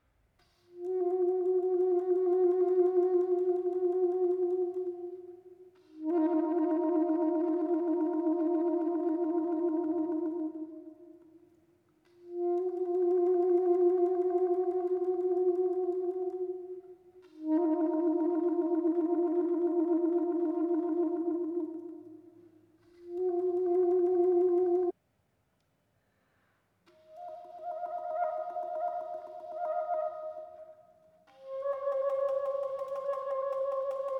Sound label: Melody for Saxophone